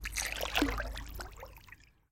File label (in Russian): voda myagkij aktivnij